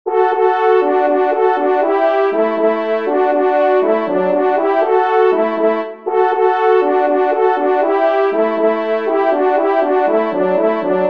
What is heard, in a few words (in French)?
Changement de foret Duo, trompes